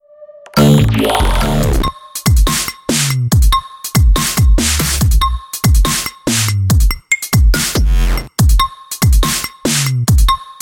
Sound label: DROID Resonate, ringtone